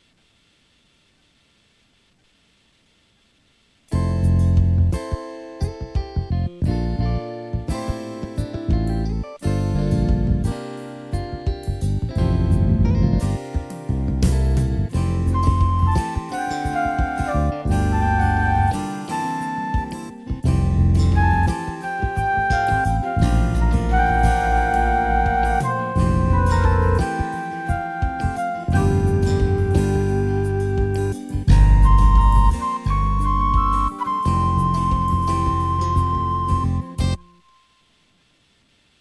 note overhang

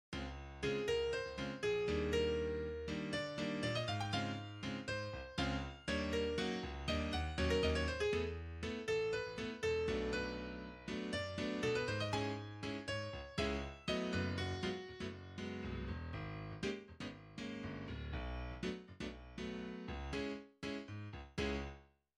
groove negative inverted

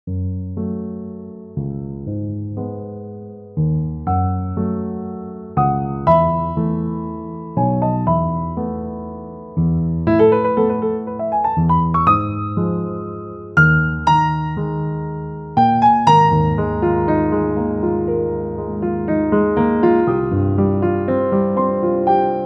piano solo

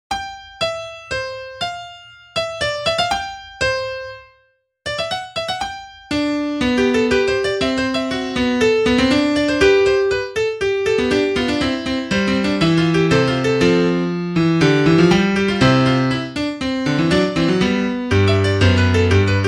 fuga

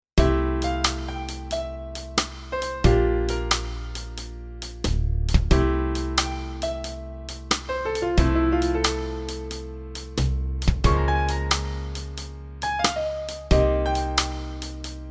drum things

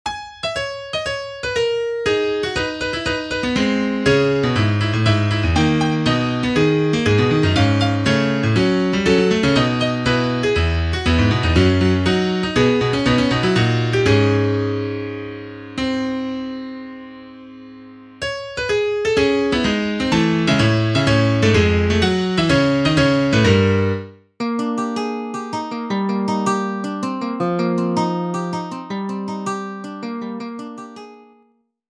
fugue

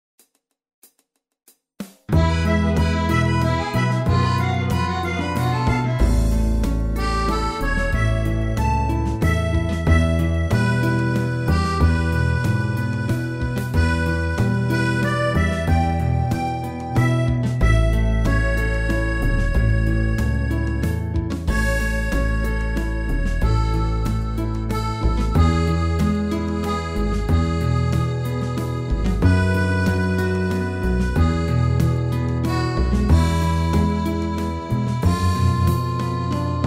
Simple Waltz; Synfire Import

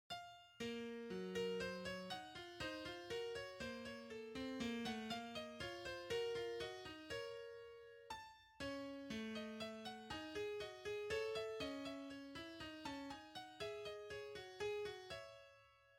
simple counterpoint dim

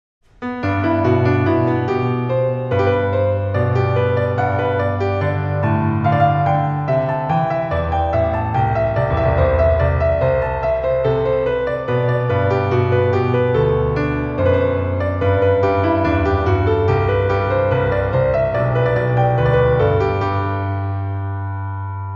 Counterpoint Exercise Result

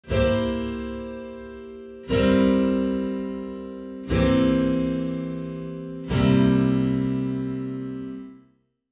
testing chords audio

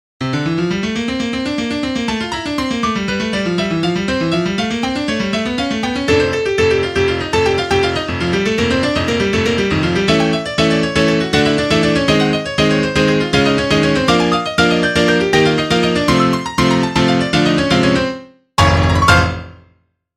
invention

